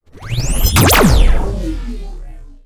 Laser Source, Future Weapons; SoundMorph; Future Weapons 2

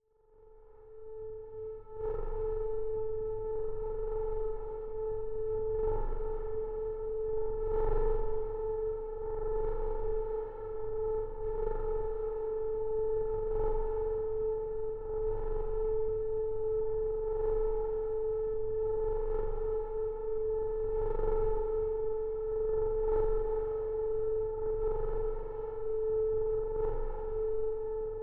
Magnetic Field; 99Sounds; Magnetic Fields

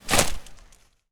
Ball Net, Soccer; Stuart Duffield; Soccer SFX

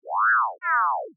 Droid Vocal; Fox Audio Post-Production; Mini Library

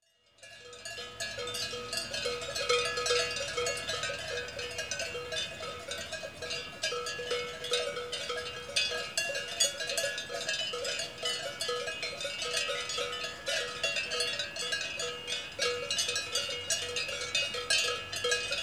Switzerland, Large Cowbells; European Soundscapes; BBC Sound Effects Library

